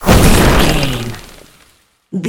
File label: nature spell vines storm